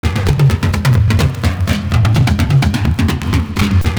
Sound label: FLANGE TOMS; Univers Sons